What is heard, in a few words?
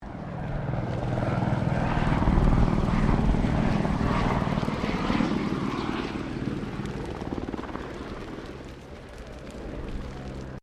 helicopter Bell 204 firefighter passing distant; Helicopters